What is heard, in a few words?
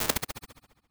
Loose Reflections; Small Spaces; Warped Reverb; Impulse Responses; Apple